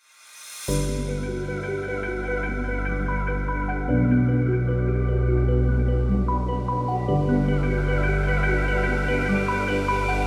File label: stylish lifestyle future bass